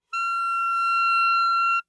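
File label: Eb Clarinet fortissimo F4; Woodwinds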